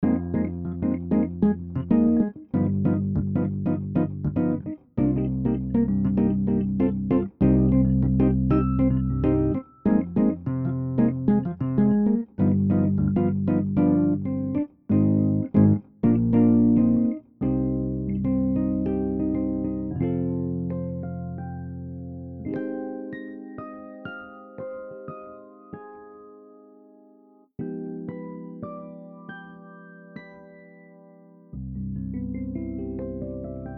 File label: Pianet T; pianoteq8; hohner